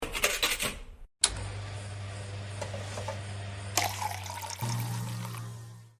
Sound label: dispense